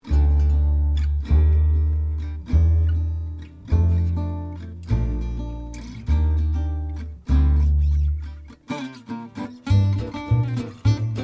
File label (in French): Douce Ambiance